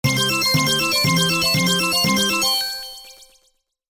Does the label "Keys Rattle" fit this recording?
No